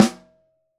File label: Top, Snare